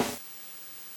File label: SNARE, NES DPCM